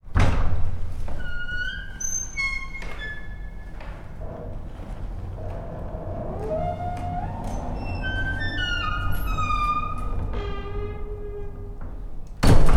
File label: Cathedral Entrance Door